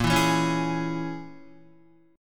A sharp Minor 7th Flat 5th A sharp, Guitar 4F,4A,5C,5G,5C,6E; strummed